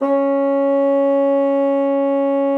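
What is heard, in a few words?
bassoon mezzo C#4; Xsample VOL-6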